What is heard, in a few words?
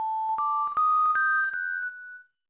spooky; mother2